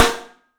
MARCH, 3D Drums; 3D Sound; MARCH XTREM

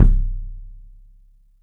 THUD KICK; 3D Drums

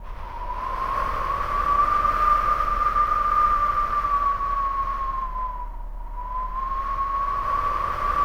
WIND HOWL, VOCAL WIND

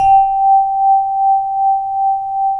VIBRAPHONE; ILIO

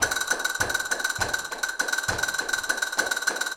Castanets, Flamenco

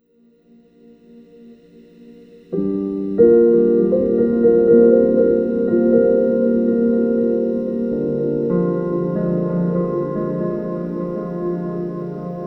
Dirty Piano; Sonic Foundry (Sony Creative Software); Slow Silhouette

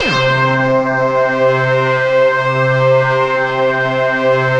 SYN K-2000, Roland

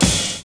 DEEP VERB

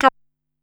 Cap, Korg Wavestation-SR, Single Hits

